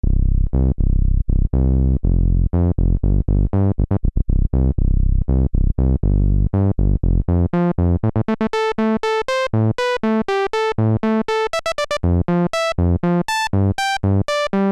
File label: novation nova desktop filter demo lpf12